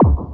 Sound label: bombo, kicks, Hardcore